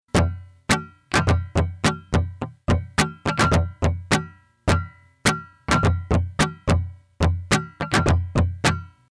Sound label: Guitar Looper Rhythm Example